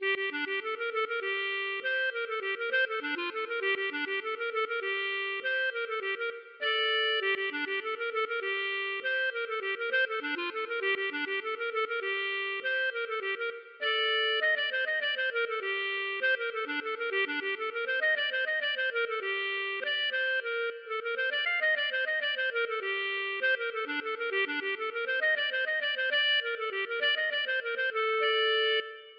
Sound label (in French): bourree